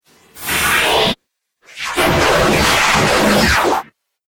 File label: Dubstep Scifi Effects